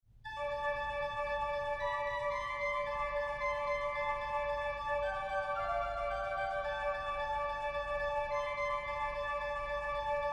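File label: toccata, organ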